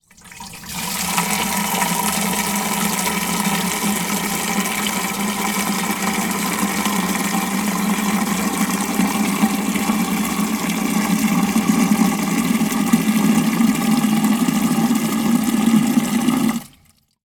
household watering can plastic fill from water butt; sound effects one